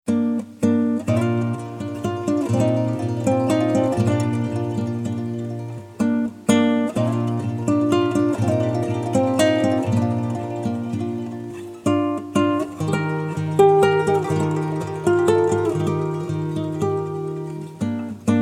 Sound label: Hacienda Lautarre